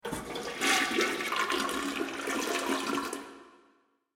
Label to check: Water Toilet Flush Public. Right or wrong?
Right